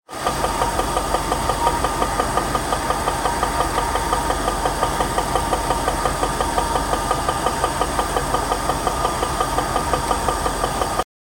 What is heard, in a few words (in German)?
bremspumpe; silverspirit; logbuch